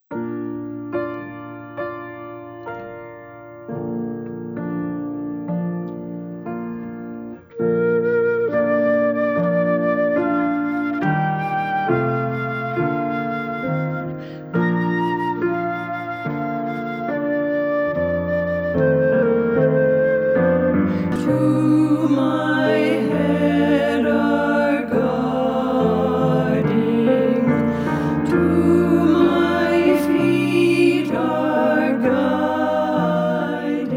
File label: Childrens Prayer